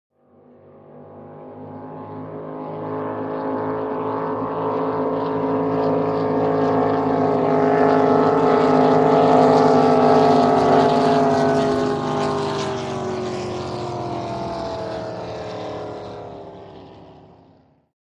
Drag Boat By 2 Boats

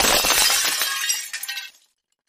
Glass Crash; windows & mirrors

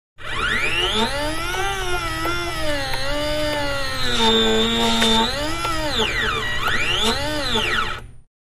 Servo Motor 4 Turns On

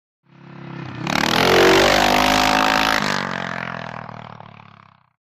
Away Trials Bike Away

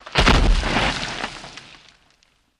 Body Falls On Dirt

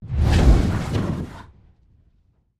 Dragon Fire Whooshes, No Crackle Element

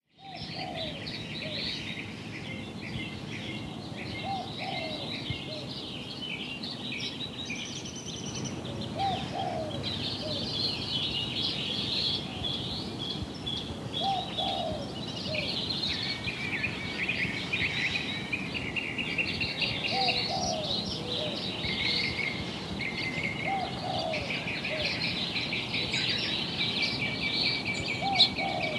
Light Traffic BG

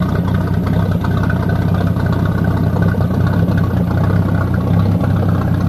Greavette Boat Idle Loop

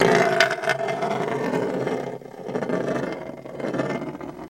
Coin Roll End Loop

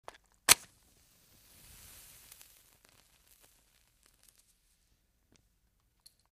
Match Strike Small

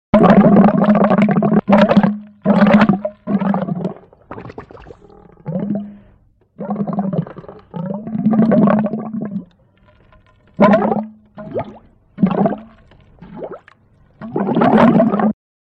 Bubbles Long Hollow

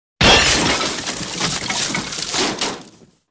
Crash Car Hood Glass

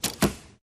Archery Shoot Arrow

